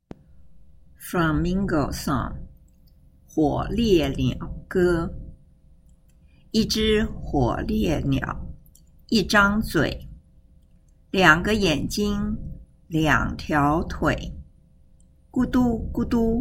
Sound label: Flamingo Song